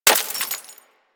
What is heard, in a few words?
Bullet Impact Debris Glass; Warframe; Weapons